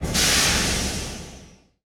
steam background

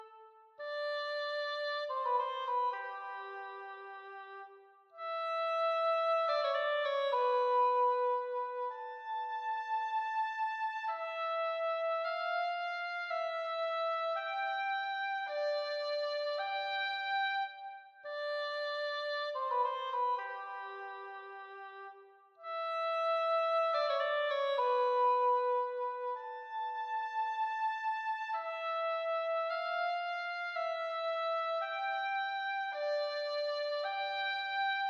oboe; Toki Tori Collection

